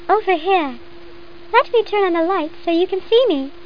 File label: bug; How Many Bugs in a Box